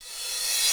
Cymbals Reverse Crash; Vengeance Samples

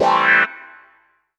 Synths C; Vengeance Samples

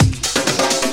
Minimal Fills; Minimal Loops

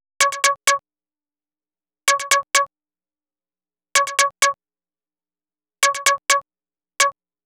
Melody 128 BPM Root C#; Vengeance Samples; Melody Kits

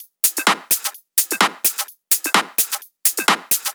Drumloops 128BPM

